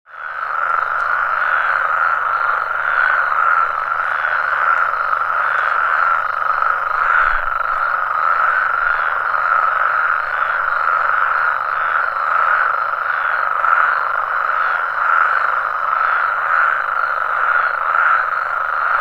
Toad Songs At Chaco

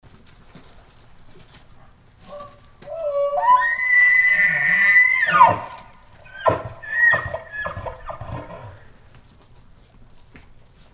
Elk Bugle

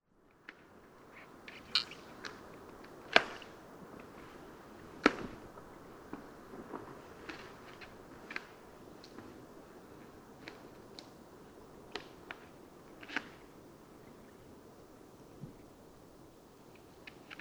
Grizzly Eating

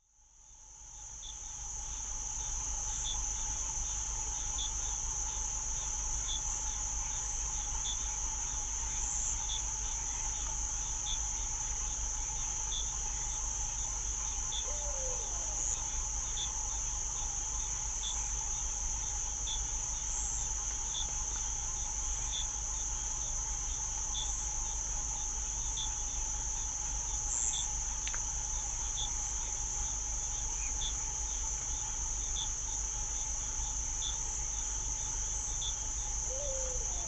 Night Chorus

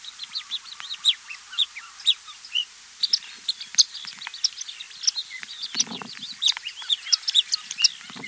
African Silverbill

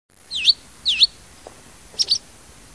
Barn Swallow

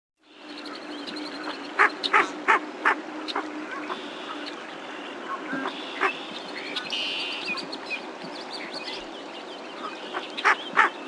ring necked duck

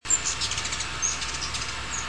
Ruby-throated Hummingbird

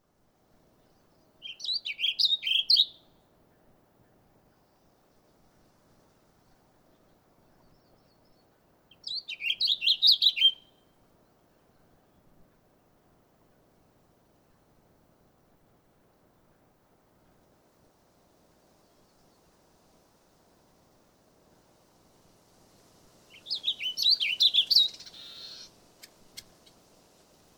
Warbling Vireo Mammoth

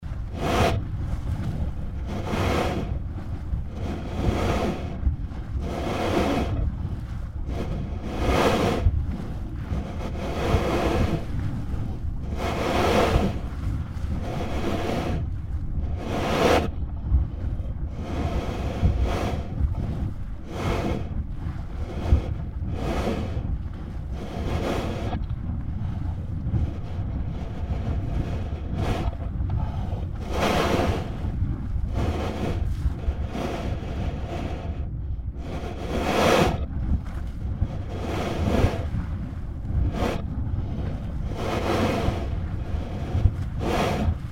Norris Geyser Basin Veteran Vent Closeup; MKH

